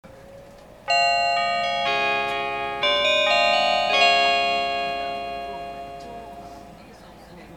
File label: chime; meitetsu